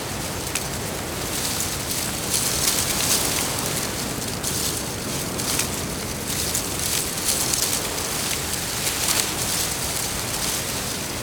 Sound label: Dropship Ground Debris Loop; Warframe; The New War; Drifter Camp